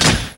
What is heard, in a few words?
HYDRAULIC; Northstar; The Wizard VOL-1